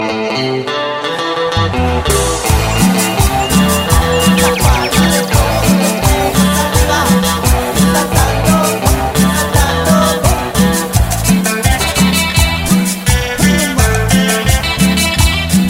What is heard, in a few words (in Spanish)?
himno de la cumbia